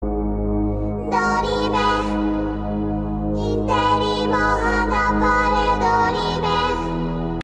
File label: noises; gabriel dropout; satania